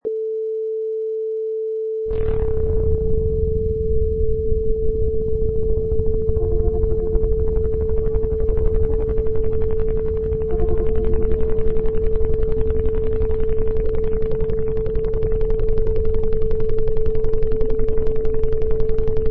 Deep Move

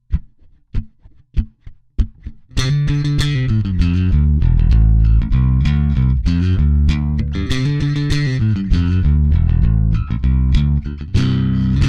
Distorted rock bass w powerchords

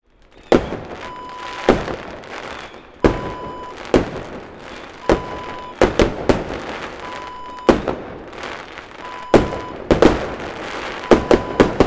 fireworks display

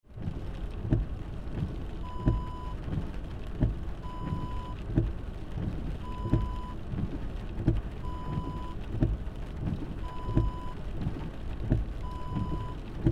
car windshield wipers

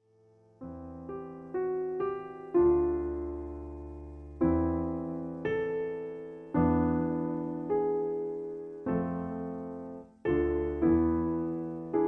Romance for Viola; Bruch; Op85

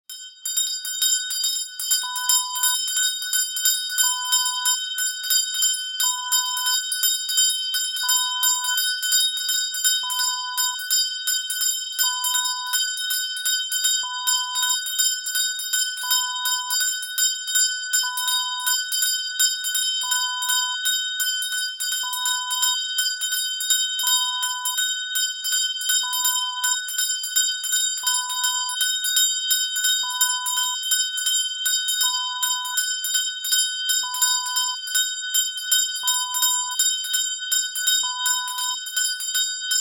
handbell

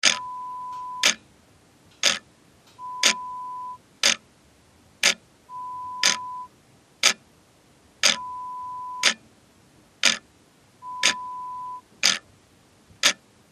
Ticking Clock

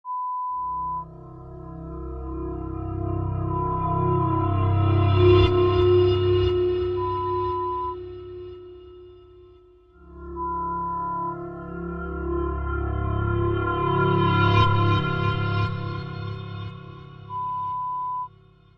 Liquid Suspense

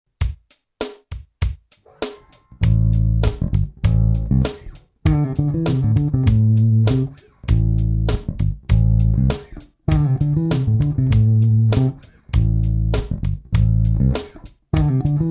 Blues lick fill in A

